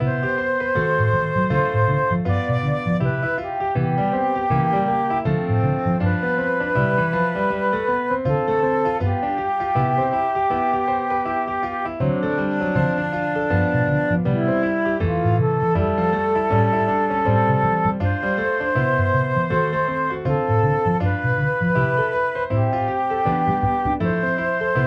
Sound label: jazz variation